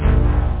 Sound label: House Anthem; boomboxdemo